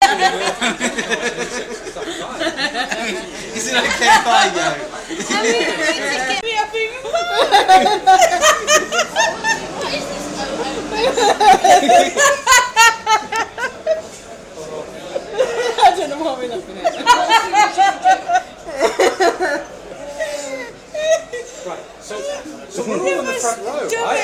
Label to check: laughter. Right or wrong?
Right